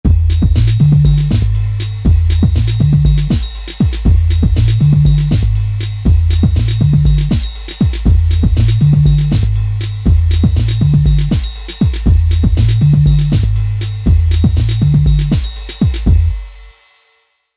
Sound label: Drum Bass